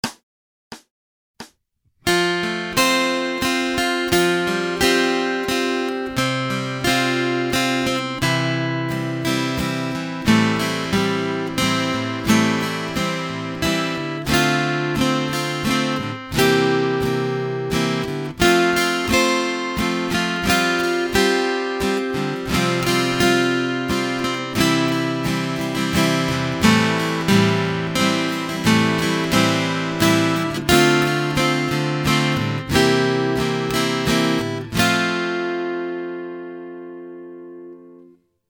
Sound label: Acoustic Accompaniment, acoustic guitar